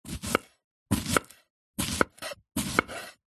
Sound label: courgette zucchini chop sequence knife wooden chopping board